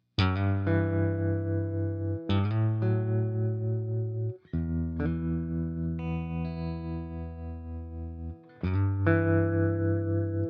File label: Tremolo Subtle Swamp bluesy telecaster into 6V6 Princeton; Terrain Tremolo